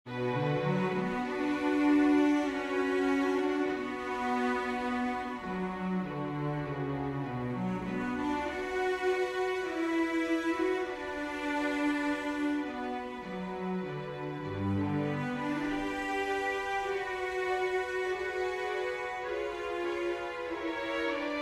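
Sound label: prélude, string quartet; chopin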